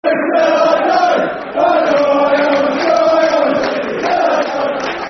City Till I Die [Football Chants (Soccer Songs)], Hull; fanchants; Hull City Hcfc